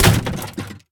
bullet hit inside

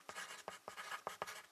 ambient port default; World Of Warships

